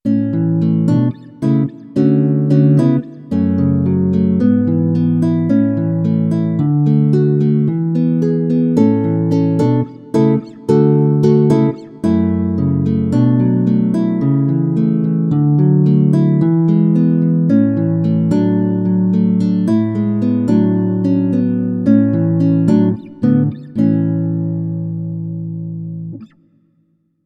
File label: M3 8-Way Nylon + Fret Noise